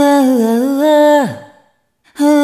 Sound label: Rhythmic G